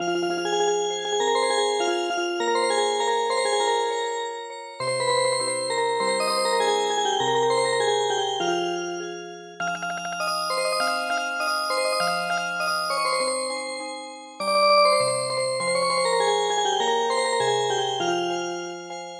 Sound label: Music Box, traditional; The Cart Drivers Song